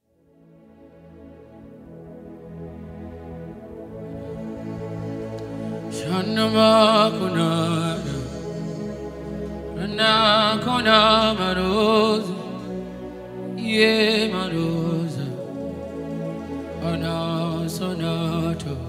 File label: Intro Chants